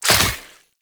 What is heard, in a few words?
Infested Spore Pistol Reload; Weapons